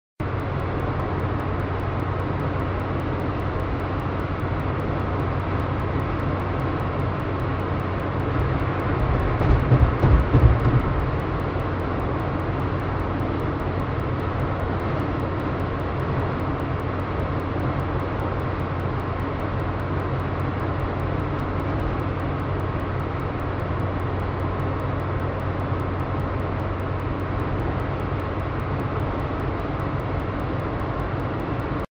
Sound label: vehicle drive; mcheli